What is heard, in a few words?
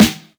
Snare Vinyl, drums one shots; Ableton Live 12 Lite; Core Library